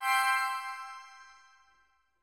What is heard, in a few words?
count up end; spinsane